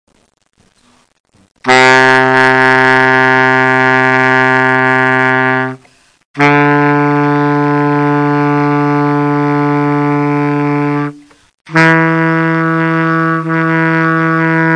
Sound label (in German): barritonsax, tonleiter; Baritonsaxophon a